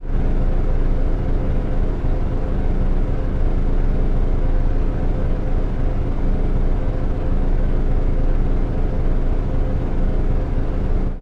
ENGINE HUM, drones & tones

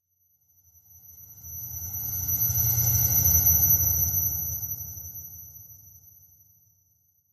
Ring High Harsh, tones & melodic

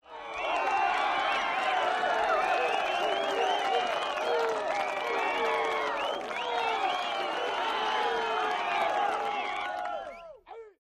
Concert Rowdy Crowd C; medium

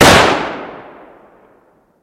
Gun Ar15 Sngl Shot

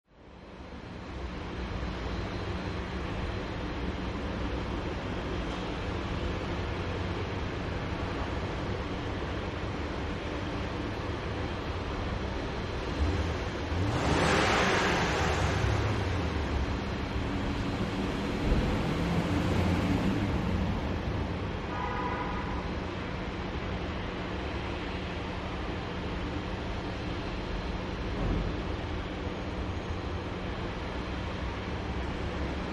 Parking Structure, big city

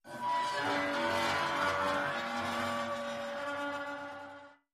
Metal Creaks Slow Scr, scrapes

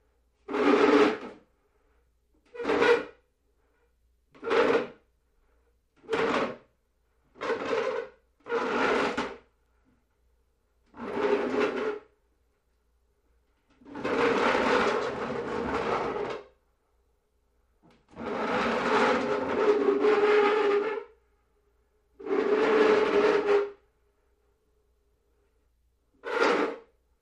Chair Piano Bench Scr, seats & stools

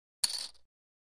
Poker Chips Tossed; casino games; chip mvmt

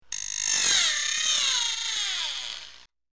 Sporadic Metal Drill Running Out Of Power

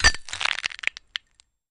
Camera Bulb Glassy F, flash pops

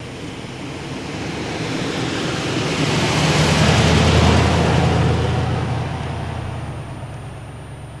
Car By Slow

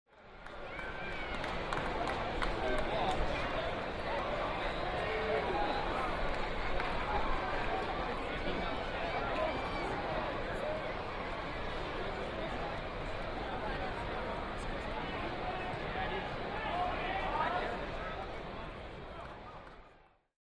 Large Crowd Idle

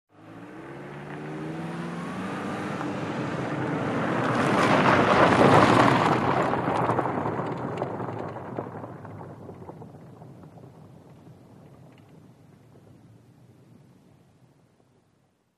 Sedan Pavmt Grvl Med

